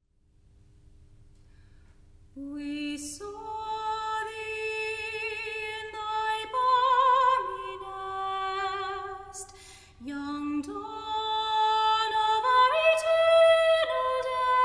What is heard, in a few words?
A Hymn Of The Nativity, Choir; Brisbane Concert Choir